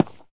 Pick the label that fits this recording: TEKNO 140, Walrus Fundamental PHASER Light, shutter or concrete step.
concrete step